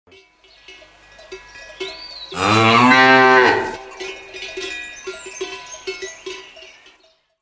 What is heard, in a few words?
muuhh; animals